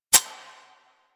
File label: Reload B A; Warframe; Zylok Prime